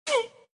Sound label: Pain hit; iStunt 2